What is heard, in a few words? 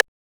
rim